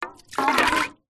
foley tin small empty no lid with water in down on ground; zapsplat